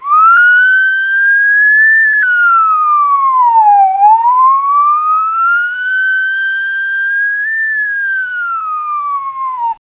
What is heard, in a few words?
slide whistle; musical instrument